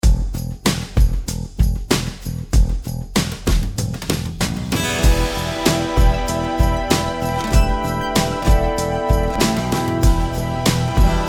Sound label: JAM GUITAR; EMI